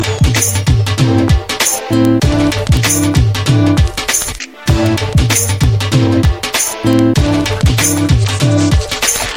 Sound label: Amplifier Dhol Beat